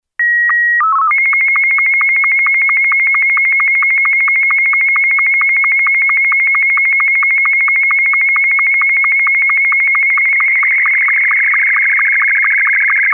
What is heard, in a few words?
sstv image martin2; essexham